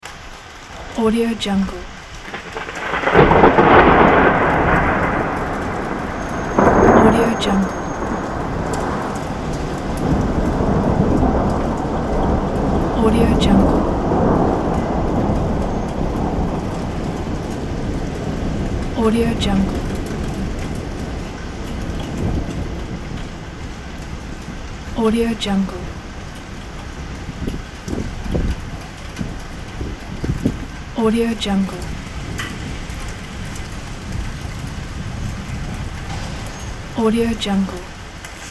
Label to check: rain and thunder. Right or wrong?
Right